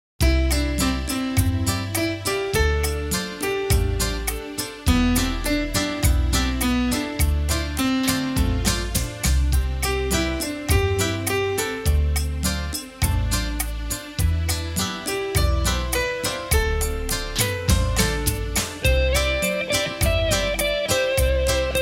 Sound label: instrumental